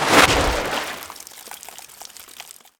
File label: ensnare target; weapons; dota; naga siren